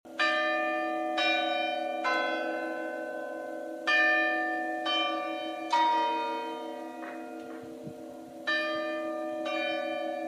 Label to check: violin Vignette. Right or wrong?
Wrong